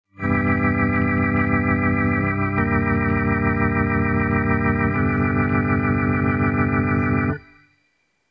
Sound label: Plush Organ Ending